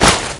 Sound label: launch; cdogs